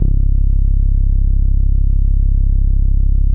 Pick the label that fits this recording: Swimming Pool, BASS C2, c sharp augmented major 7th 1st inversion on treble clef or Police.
BASS C2